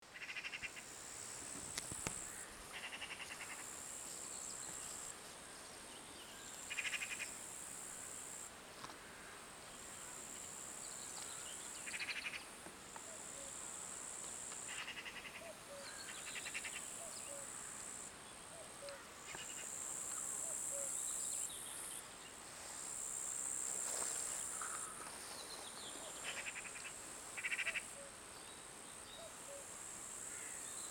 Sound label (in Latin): Pica pica; SETE